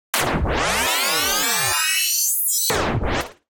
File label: Hit and Run 140 bpm, percussion; MIDI Clips; Tonal; Percussion & FX; Skitter and Step; Ableton Factory Packs